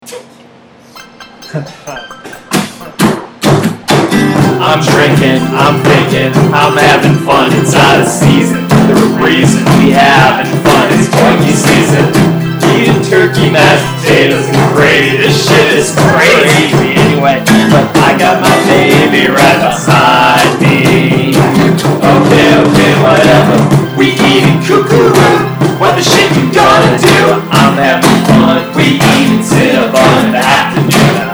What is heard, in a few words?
Random Corporate Christmas